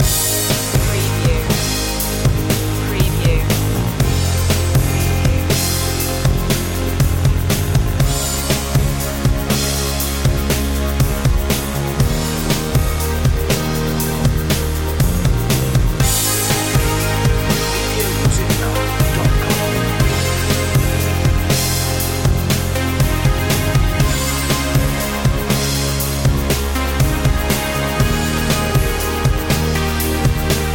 starstruck loop

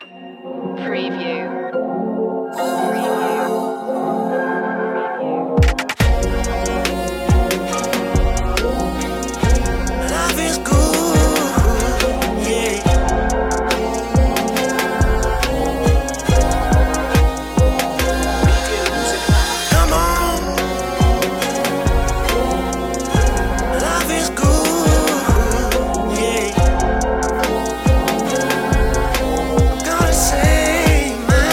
smooth soul vibes

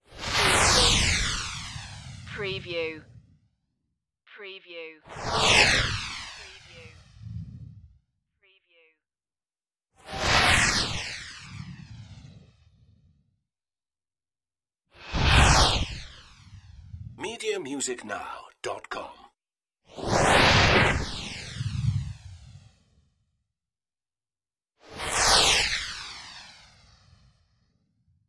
military jet flybys

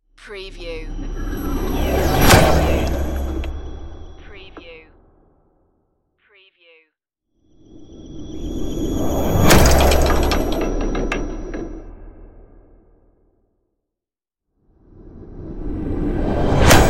wormhole asteroid impacts

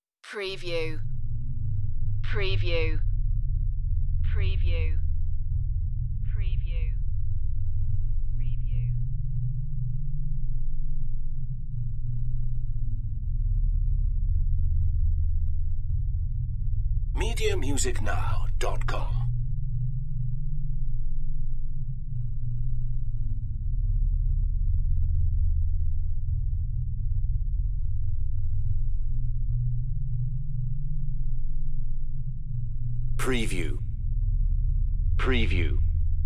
Deep Evil Bass

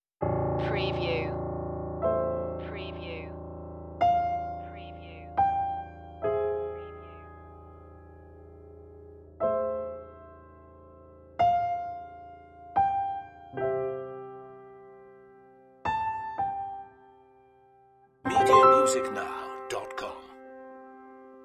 emotional piano cue

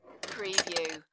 Pinball sound, roll on rail and hit metal parts

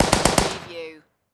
Machine Gun Vickers

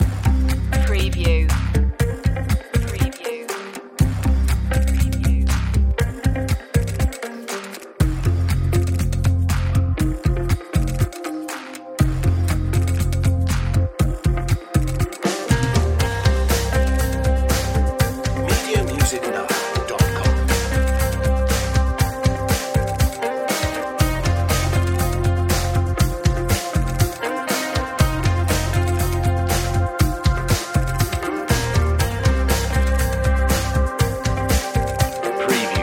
electro rock groove

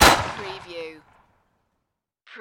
Grenade Launcher

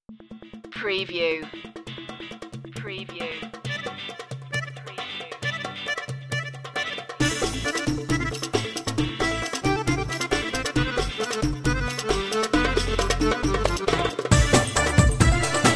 dance party oriental no synth lead

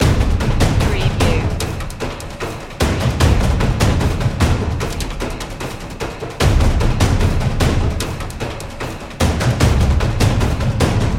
drums of destruction loop

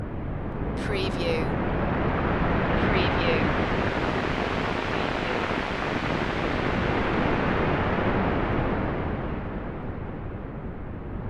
water slow heavy waves loop short

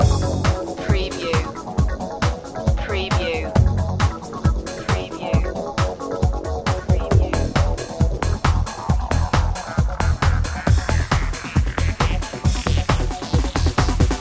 electricity loop

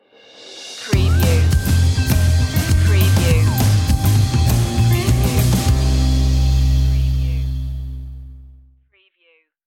showroom stinger

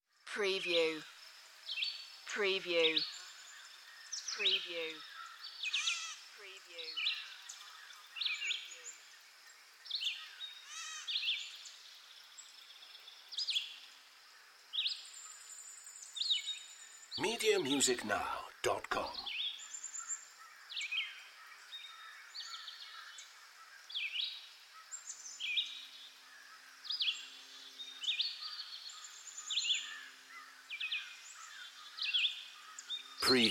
Nature Ambience Birds In a Park

